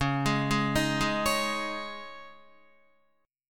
Db Suspended 2nd Guitar 4F,4A,5C,5G,5C,6E; arpeggiated; JGuitar

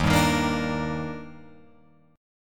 Eb Major 7th, Guitar, Open F; EbM7; strummed; JGuitar

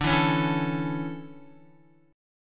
D Major 7th Suspended 2nd, Guitar; DM7sus2 strummed; x,5,4,6,4,5

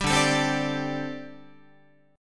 D 7th Suspended 4th, down, Guitar

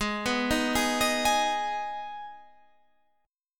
Gsharp Minor; x,x,18,16,15,15,17,17

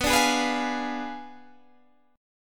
B Diminished 7th B down Guitar Standard; Bdim7 strummed

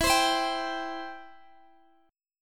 E Minor Sharp 5th, Guitar, DADGAD; strummed; x,x,14,17,15,17

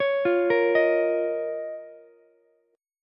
D sharp 7th Suspended 2nd D sharp, Ukulele; arpeggiated; D#7sus2; 18,17,18,18; JGuitar

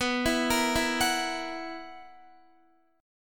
B Major 7th Suspended 4th B down Guitar; BM7sus4; arpeggiated

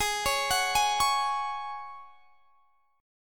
G sharp 7th Suspended 4th; G#7sus4; x,23,23,23,21,21; arpeggiated

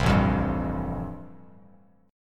A-Minor 7th Sharp 5th, Bass Standard, chord; Am7#5 strummed; 8,0,3,0; JGuitar

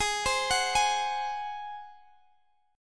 Ab 7th Ab down Guitar Open G; arpeggiated

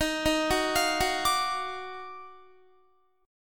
D sharp Minor Major 9th D sharp down Guitar; D#mM9; arpeggiated; JGuitar

